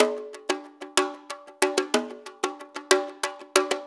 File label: 6-8 Conga Brush, Perc Loops